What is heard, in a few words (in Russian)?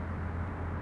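шум города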